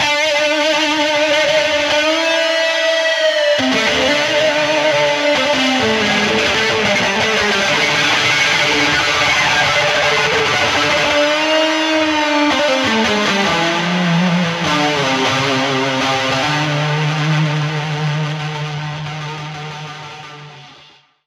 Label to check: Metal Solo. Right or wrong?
Right